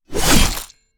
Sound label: Guillotine blade drop impact hit; medieval